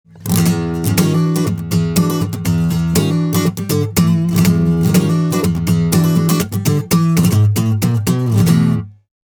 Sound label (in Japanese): 生音 カッティング